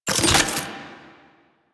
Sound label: Alchemy Grenade Drop; Warframe; Entrati